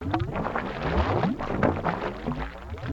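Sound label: Water Through A Hose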